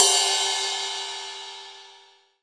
RIDE, MONO RIDE CYMBAL

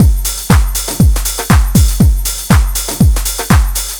DRM, House loops 120; Best Service ProSamples vol.10 - House; AKAI

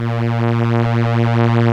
STR ELKA Cellos, Elka Strings; Roland LCDP09 Keys of the 60s and 70s 1

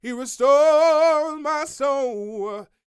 Vocal Gospel; Vocal Planet Vol1 Gospel

